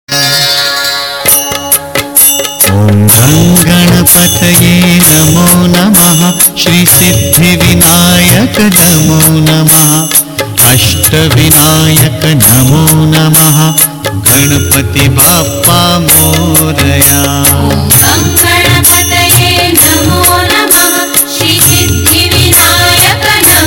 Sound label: Ganesh Mantra